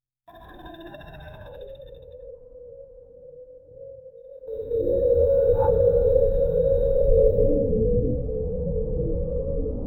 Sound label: echoing pulses of alien; science fiction